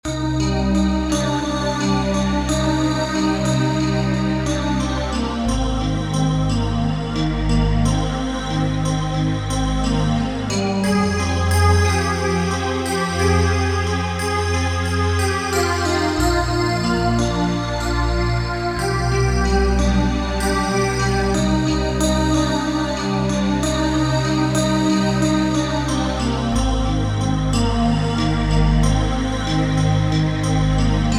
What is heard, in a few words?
pad